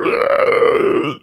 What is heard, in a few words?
Zombie; Blind Survival